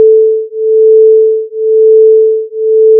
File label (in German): schwebung, akustik, ueberlagerung; physik